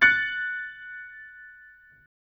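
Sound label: F sharp minor highest, chords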